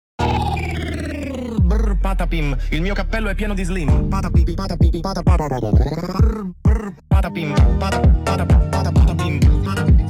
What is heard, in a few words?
funk 8D Audio